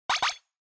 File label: Onoma Surprise Low